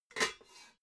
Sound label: pots clank quiet